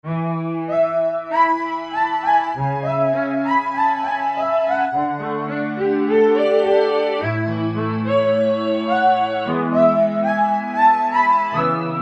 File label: SingingPiano, Roland U-220; Factory Patches